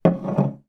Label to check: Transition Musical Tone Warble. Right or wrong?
Wrong